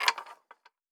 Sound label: Metallic Gear